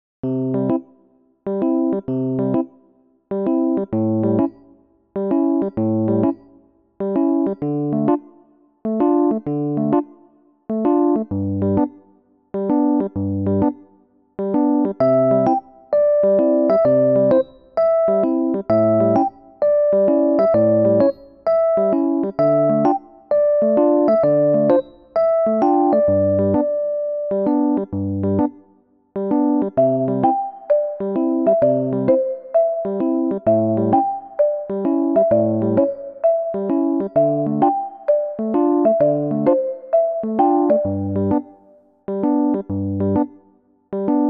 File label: Newage; 밝고 맑은